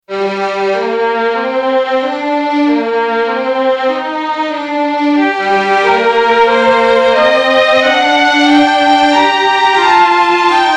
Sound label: Classic Strings